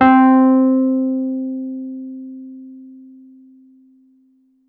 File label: E-Piano Wurli C3; Piano & Keys